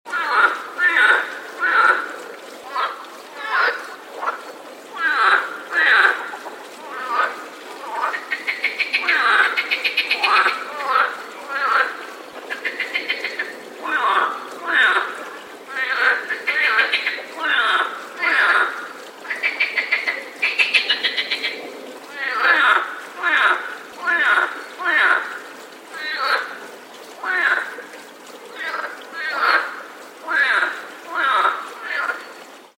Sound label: frog sound ringtone